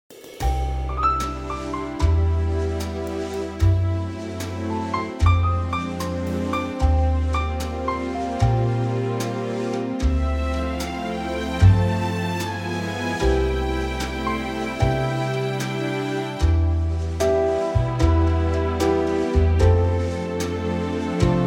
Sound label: C orchestral; key - C - vocal range - C to E; Dreamy orchestral arrangement